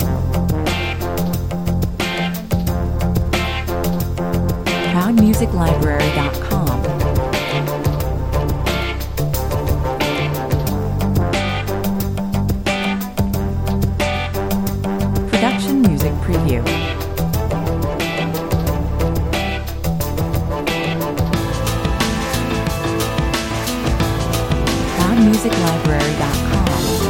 sirens 90 bpm

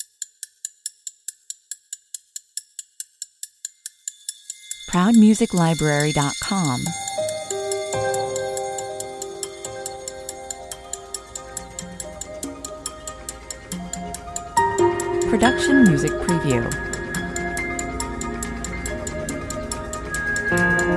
time passing by 140 bpm

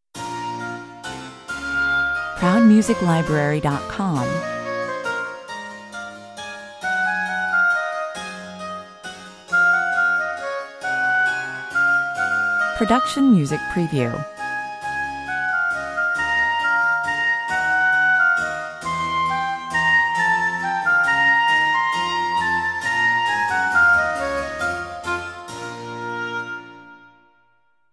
baroque oboe

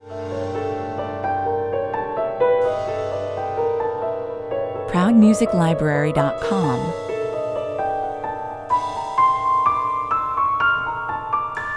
piano atmos

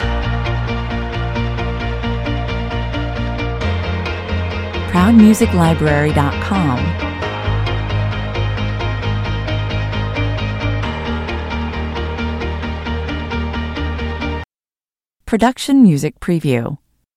fast car loop

